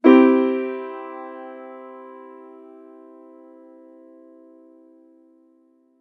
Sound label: Piano C major chord